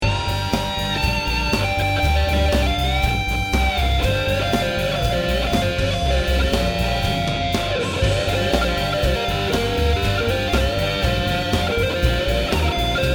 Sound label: solo